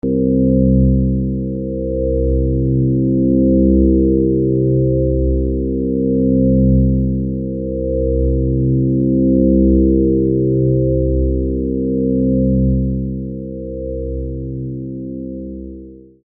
Aditive RAW

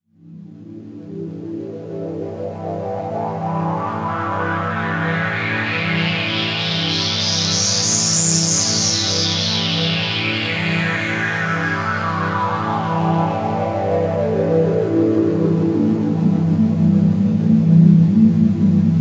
2 saw eg lfo

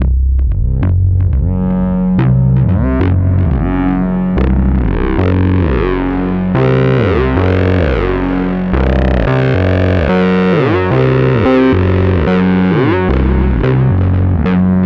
2 saw osc + dist + delay

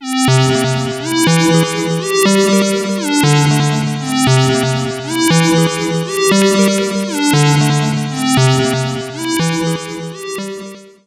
sqr lfo port

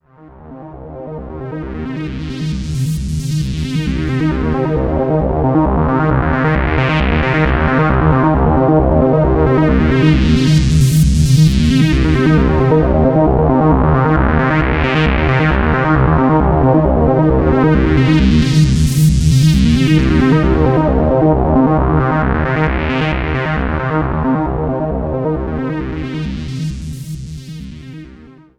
2 osc saw lfo

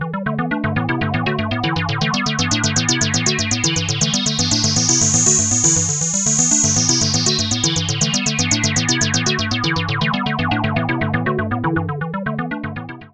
sqr detune

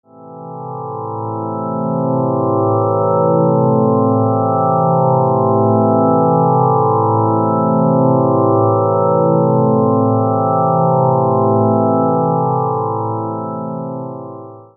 Aditive Chord RAW

